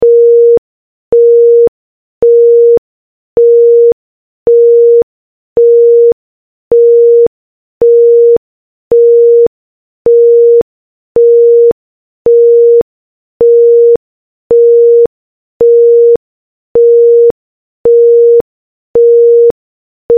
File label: Beeps